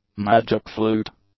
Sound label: magic flute; nouns